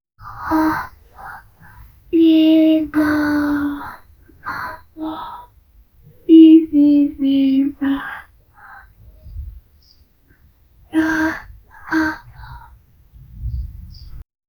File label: dancing romantic, dance